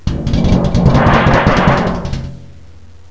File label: CAN DRUM